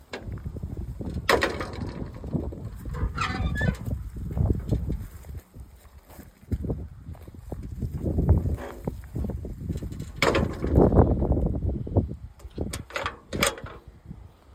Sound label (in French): ancien entrée, PORTAILS; LUSSAIS 79